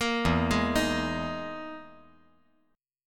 E Major 7th Flat 5th

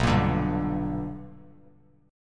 B Minor Major 7th Sharp 5th Bass Standard; strummed; JGuitar

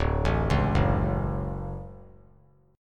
D Major 7th, chord; arpeggiated; JGuitar

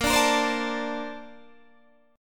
Bb Major 9th, Bb, down, Guitar, DADGAD

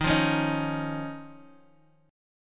Eb 7th Suspended 2nd Eb down; strummed; chord

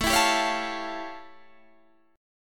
A 7th Flat 13th; A7b13 strummed; Guitar; JGuitar

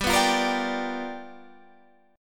G 7th Sharp 9th G chord, Guitar Drop D

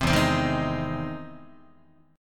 E Augmented Major 7th E down Guitar; E+M7 strummed; JGuitar

